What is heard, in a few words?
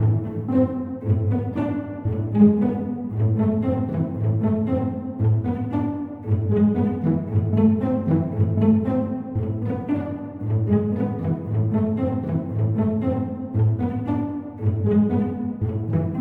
cello pizzicato; звук виолончели